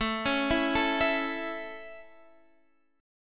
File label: C sharp Minor Sharp 5th A